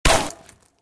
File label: Bullet hits on ground dirt and small debris